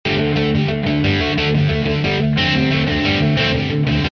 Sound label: D 120 rhythm